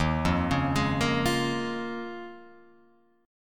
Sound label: D sharp 7th Suspended 2nd Suspended 4th down Guitar Open F; D#7sus2sus4 arpeggiated; JGuitar